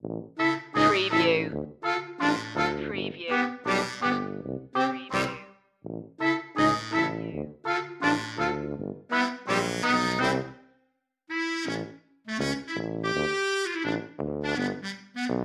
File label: no drums guitar or piano